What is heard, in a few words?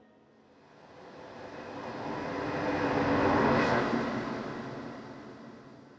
echoes; thief 2014 gamerip